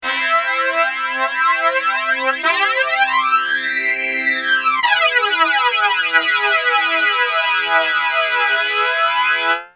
jd990 brass ada flanger

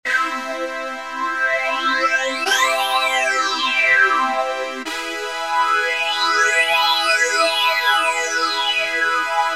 jd990 brass mam phaze2

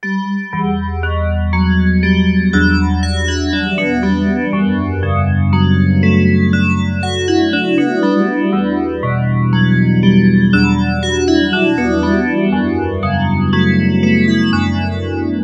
DX7 bells, Moogerphaser

